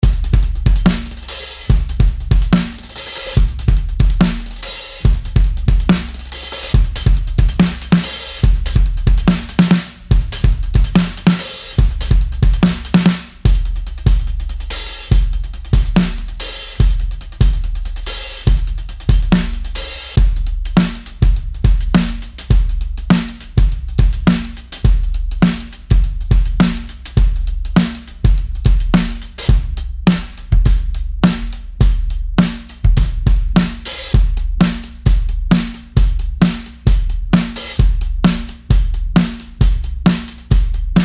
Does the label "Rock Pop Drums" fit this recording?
Yes